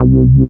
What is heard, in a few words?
bass C, Talking Bass, Dance Synths